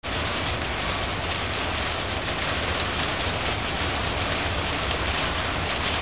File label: RAIN